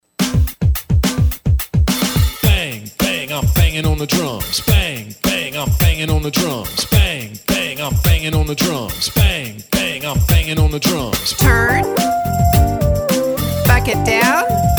Banging on the drums with vo

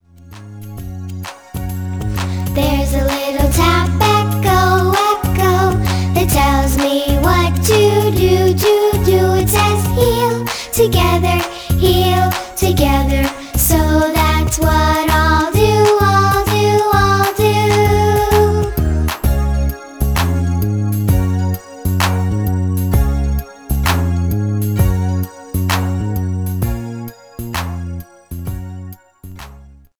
Echo Tap

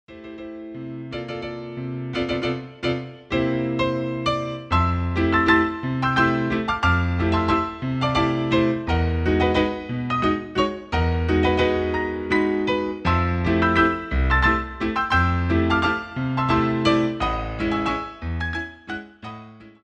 waltz

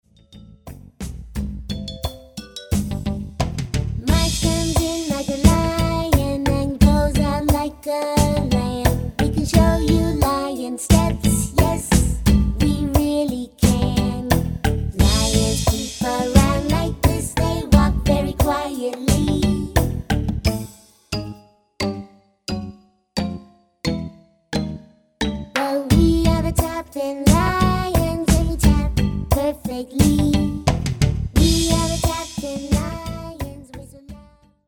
Tapping Lions without vocal

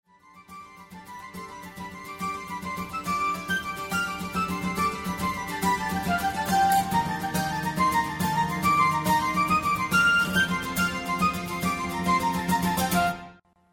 Irish Sailor Jig

